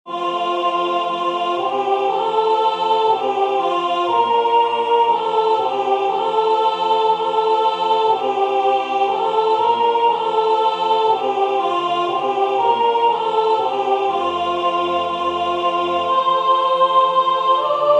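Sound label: Soprano; Alta Trinita beata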